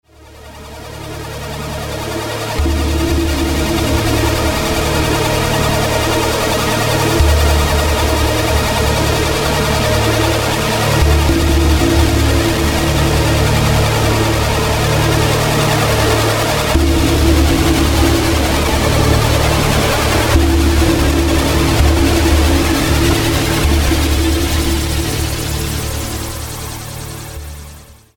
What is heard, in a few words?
noise attack; Bruits électroniques - envahissant - désagréable